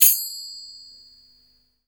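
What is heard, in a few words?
percussion FX, hip hop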